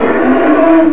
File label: attack